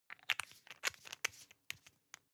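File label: toothpaste close rattle, Bathroom; haus-garten; badezimmer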